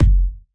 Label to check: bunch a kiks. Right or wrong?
Right